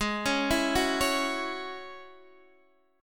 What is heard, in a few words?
Ab 7th Suspended 4th Sharp 5th down Guitar DADGAD